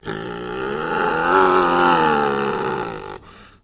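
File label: Tiger High Growl Young; big cats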